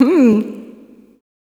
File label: Dance Vocals, FEMALE; Best Service ProSamples vol.12; AKAI